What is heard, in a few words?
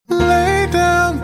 lay down